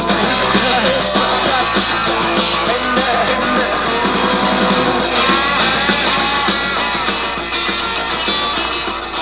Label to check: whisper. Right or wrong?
Right